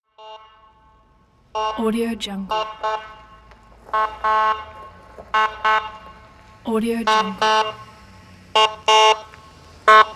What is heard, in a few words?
ambulance siren horn; بوق کوتاه آمبولانس; urban sounds